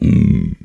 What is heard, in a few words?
Grr; Melonbra